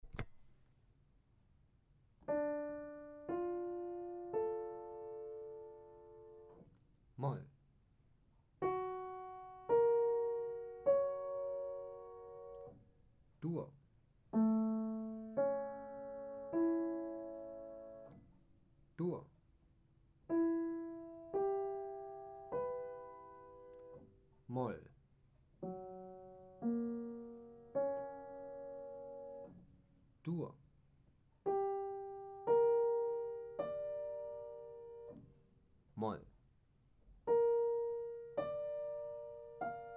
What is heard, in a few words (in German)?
dur moll 3klaenge; gehoerbildung